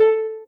soft hitwhistle; osu

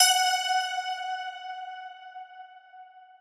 Crystal F#6

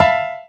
combo break

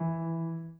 Piano Chord

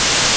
static; osu

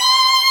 violin; osu

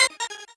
normal hit normal

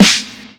drum hitwhistle; osu

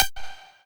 lead

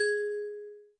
Key

